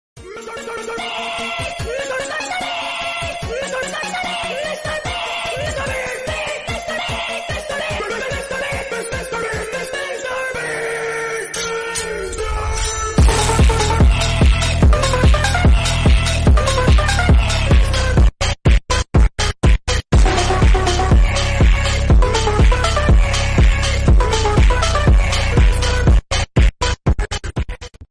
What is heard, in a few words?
meme phonk remix tiktok song; mr beast